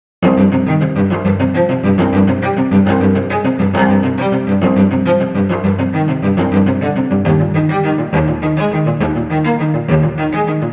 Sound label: Cello Solo Classical Cello Music